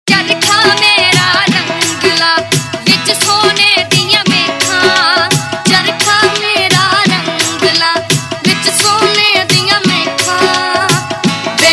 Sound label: Boliyan Ringtone, Punjabi Pop